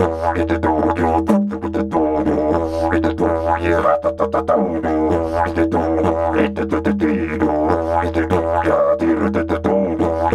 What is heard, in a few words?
Didge 93 BPM, Didgeridoo